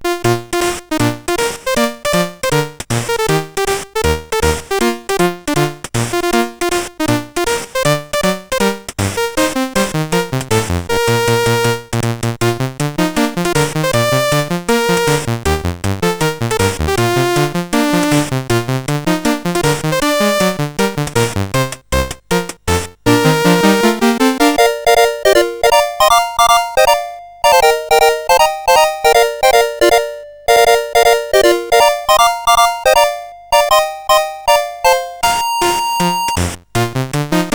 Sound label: Fireworks Celebration, CSG8580R5